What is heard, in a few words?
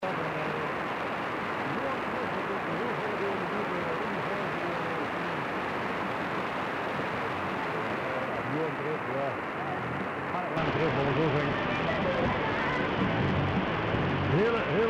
1653khz; Radio Barones; Sony Eton